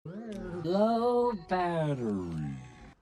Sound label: low battery